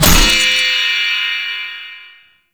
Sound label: Medusa Puzzle Orb Shot Start; Warframe; Duviri